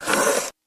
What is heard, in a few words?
Door Outer Close Dam, dat machine